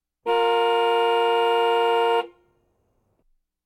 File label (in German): hupton (anhaltend); Auto-Zweiraeder